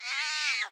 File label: weaver spider scream; Hollow Knight